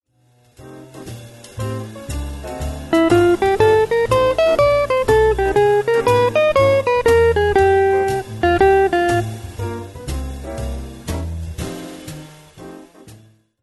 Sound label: guitar jazz blues; wes montgomery guitar jazz blues lesson